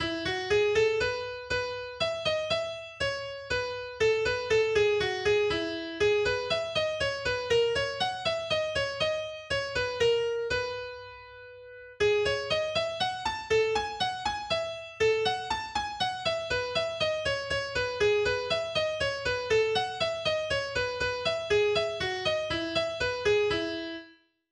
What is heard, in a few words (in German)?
Menuett in E Solo AkGit; Melodie-Solos; Falckenhagen